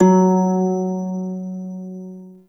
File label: Prime Shawzin Oct One F Sharp; Warframe